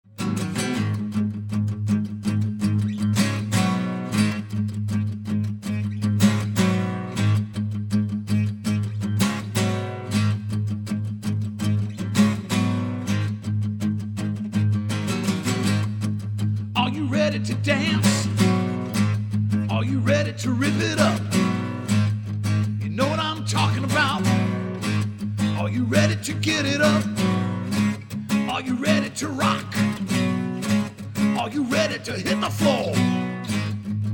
acoustic

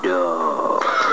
Dog

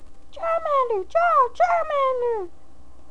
Charmander Impression; Pokemon Voice Impressions

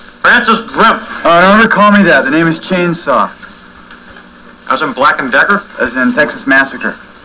chainsaw

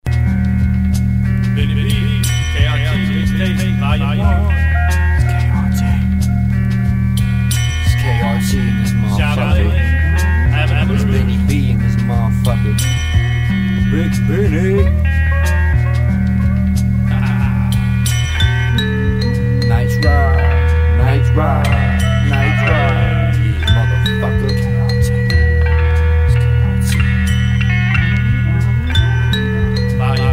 ILLUSIONS BEAT

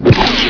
Hit pod; hover

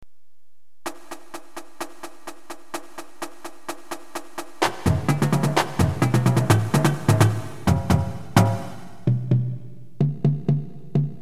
Funk In the Trunk, drumline

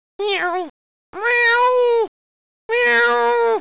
meow

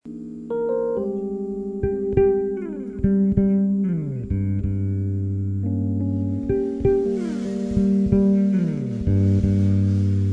Bas

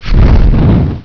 firestorm

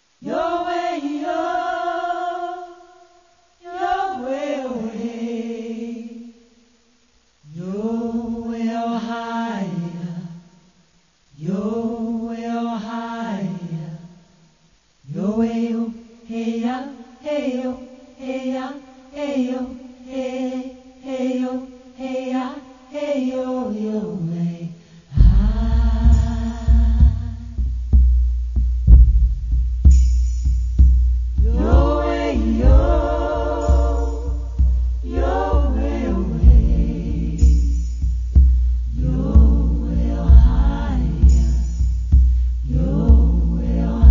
Chant Apache; Honor

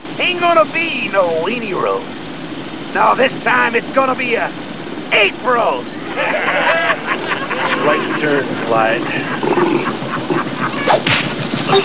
right turn

